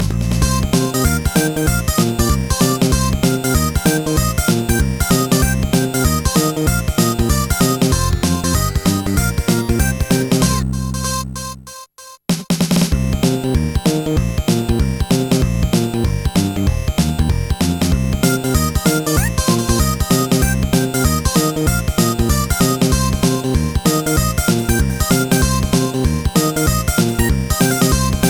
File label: noise , chiptune